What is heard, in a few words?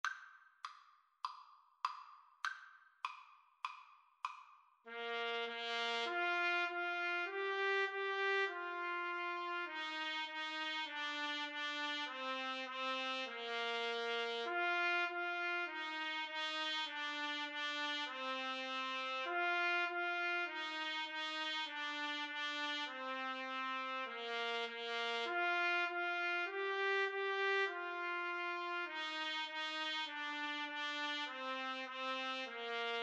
trumpet duet